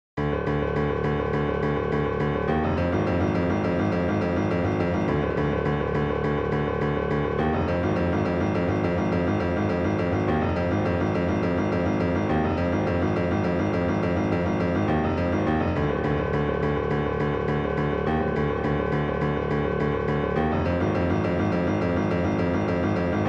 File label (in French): piano et marimba; pieces pour petits ensembles; experimentations; experimentation 1